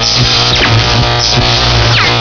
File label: electron claw; PC Gamer IT CD 41; Daikatana